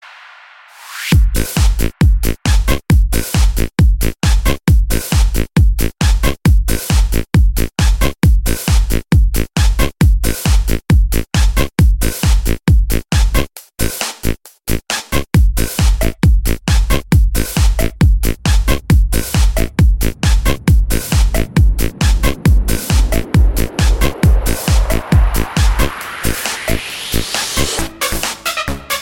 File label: Extra Bass